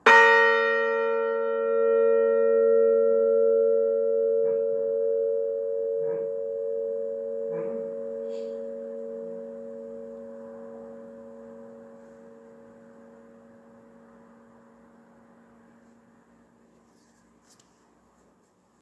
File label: bells of sussex; milland